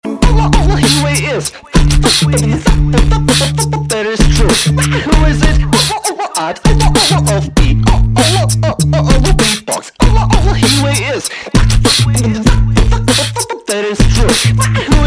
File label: Beatbox